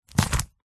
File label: book folder plastic wallet style close